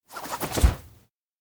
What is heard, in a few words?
fetch friskbee; tsumire; anemoi